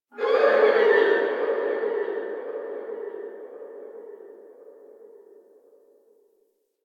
Stadium Infested Far; Warframe; Ambience; Nineteen Ninety Nine

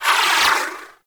Space Fighter Rocket Proj Whizz By; Dredger; Warframe; Vehicles; RailJack; RailJack Enemy Ships; Grineer; Space Fighter Dredger Agent

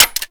Pistol Mech; Kohm

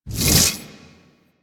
Impact Retract; War Pact; Warframe; Priest

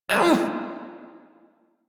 Pain, Male; Dialog; Warframe; Railjack Crew; Perrin Sequence

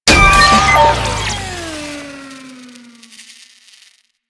Jackal Stun Deactivate; Warframe; Jackal Bursa

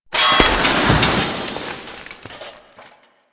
Fire Extinguisher Destroy; Warframe; Ambience; Nineteen Ninety Nine

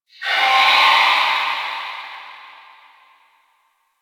Infested Distant Creature Cry, Ambience; Warframe; Corpus Infested

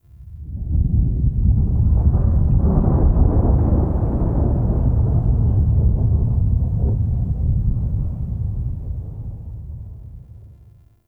Exterior Rolling Thunder

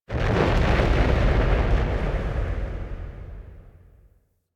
Gas City Distant Cloud Clap Explosions; Ambience; Warframe; Corpus Gas City

